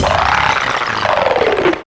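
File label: tape wind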